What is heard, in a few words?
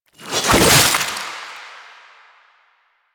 Save Chest; Warframe; Kuva Lich; Finishers